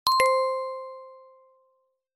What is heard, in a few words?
sms tone ringtone; imo